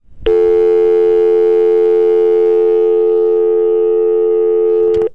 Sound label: dialtone